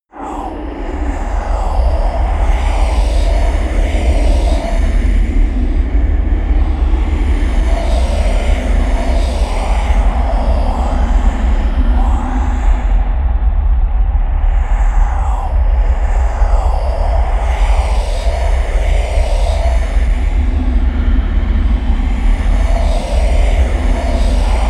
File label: atmosphere voices from hell, Horror